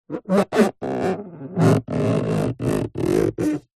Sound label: metal rub finger squeak; zapsplat